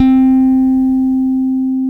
C-4, FINGER BASS; AKAI S-Series CD-ROM Sound Library VOL-7; FINGER BASS1

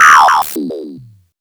ARP2600; AMG - Vince Clarke Lucky Bastard

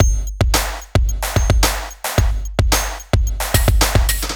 bpm110 fx; Effect Loop

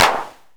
Bass Clap, Jomox Airbase99

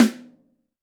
Snare, Roland TD-20

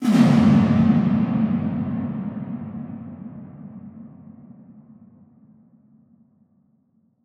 Huge Verb Tail, Long Reverb Tails; impact samples

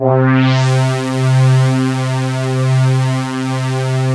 DRONE MULTI ZONE C2; Best Service Alpha Dance vol.2

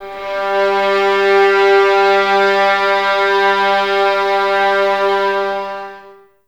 VS G 3, Violins; Miroslav Vitous; 11 Violins

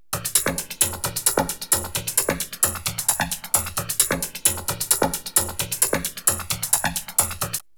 DRUM, 132; Megabass Remix! 2

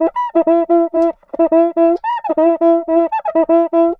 CUICA, DRUMS SAMBA; Best Service ProSamples vol.14; AKAI

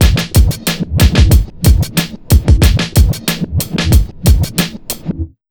92 BEAT, Pure Electro, BEAT MIX

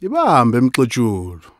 Vocal Chirp, African Voices

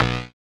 SYN TWO, Dance Synth; Sound & Vision - Gigapack I CD 2 (Roland)

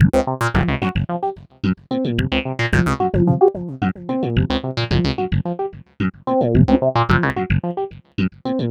philter (acid funk); Sonic Foundry (Sony Creative Software); Ugly Remnants VOL-2

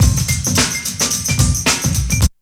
break; Zero G - Man Machine

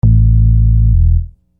Groove Bass, Hip-Hop Street Beats; Construction Kit; Sonic Foundry (Sony Creative Software)

